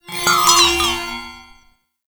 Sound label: Enemy Movement, Foley; Warframe; Follie Frame